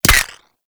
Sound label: Ghost Expel Spirit Impact